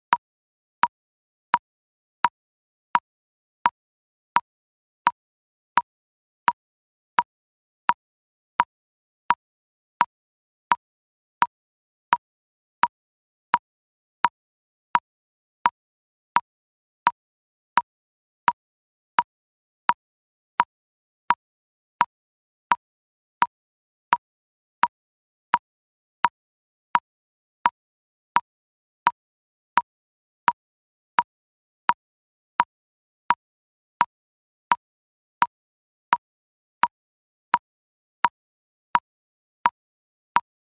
Picking Pattern; 백킹트랙 ON

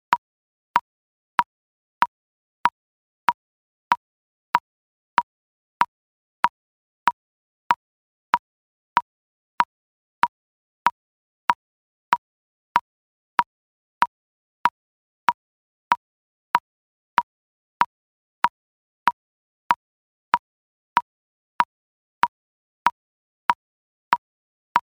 Boom Chick & Bass Walk-up, 기타; 백킹트랙 ON

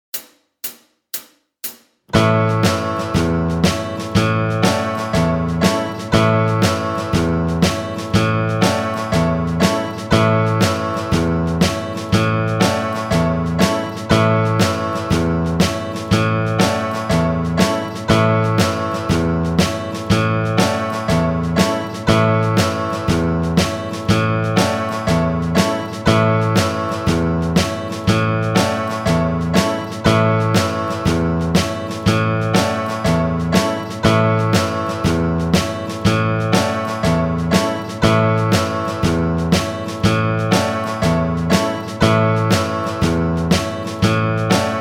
Classic Country Lick, 기타; 백킹트랙 ON

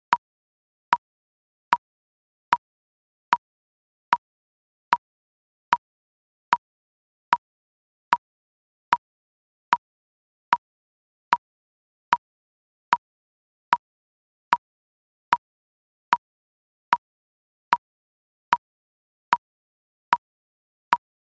The Steady Bass, 기타; 백킹트랙 ON

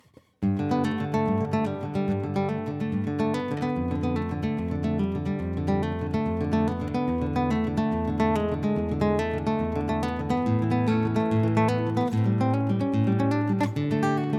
Fingerstyle booster; 백킹트랙 ON

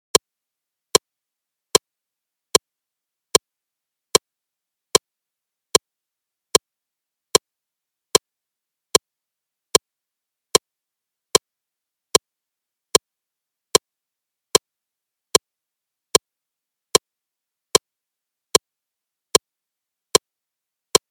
Open String Lick, 기타; 백킹트랙 ON

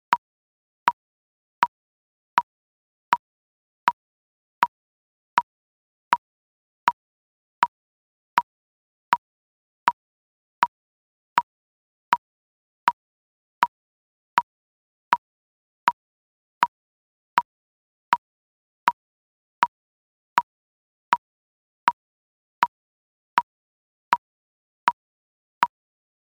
Steady Bass 12-Bar Blues, 기타; 백킹트랙 ON

